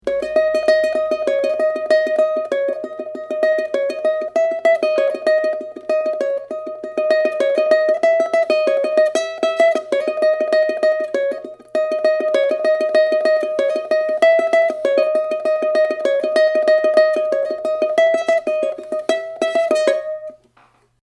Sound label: Panjabi, ukulele